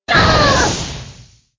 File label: bomb launched; angry birds space